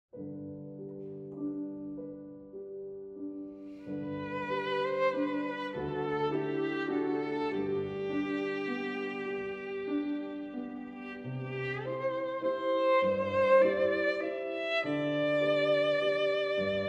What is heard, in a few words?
Lullaby; Mozart